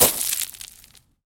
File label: monster appears